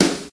bright acoustic snare d key

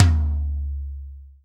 high floor tom drum sample d key

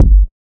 low tone steel kick drum g key; kick drum samples

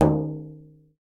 big tom drum single hit d sharp key

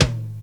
80s dry tom single hit A key; tom drum

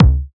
smooth kick drum sample c sharp key; kick drum samples

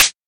Hot Closed Hi Hat Sound C Key; hat samples

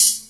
90s sizzly hi hat f key

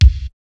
present kick single hit c key, modern kick drum

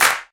clean clap A key; single hits one shots

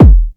clean kick one shot e key; kick drum samples

